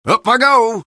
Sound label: taunt flip fun; engy; taunts